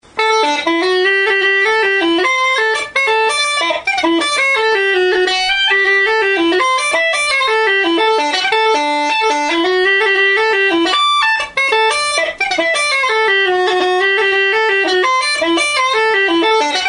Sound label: tune, Uilleann